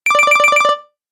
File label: Game Collect Your Bonus, Video Game Sound Effects